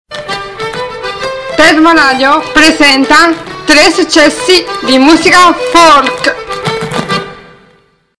jingle folk; successi

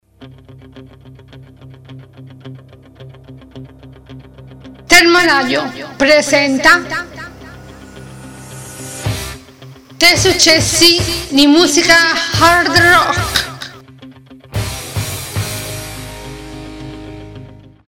hardrock, jingle; successi

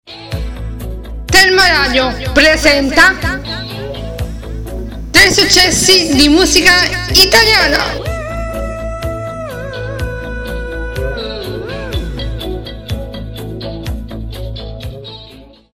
jingle italiana; successi

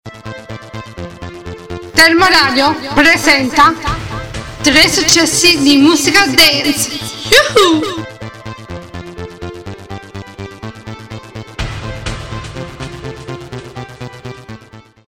dance, jingle; successi

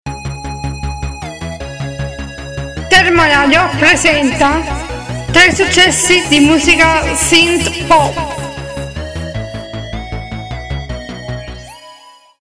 jingle synthpop; successi

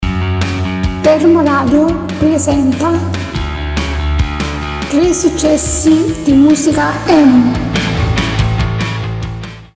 emo, jingle; successi

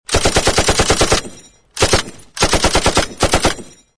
Machine Gun Shells; suoniFX

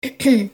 hmm hmm; personeFX

